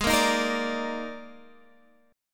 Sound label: D sharp Major 7th Suspended 4th G sharp, chord